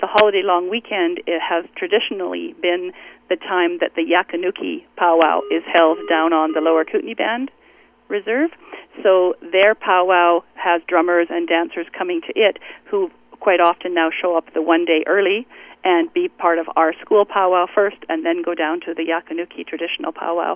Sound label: Drummers, Youth Pow Wow; school district hosts 8th annual youth pow wow